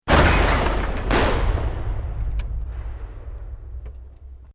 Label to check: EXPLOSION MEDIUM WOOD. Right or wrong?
Right